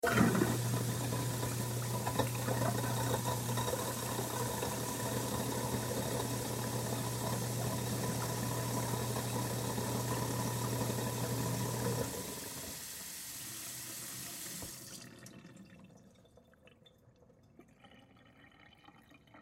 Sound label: Garbage Disposal, Garbage Disposal Run Water Run; Appliance; Household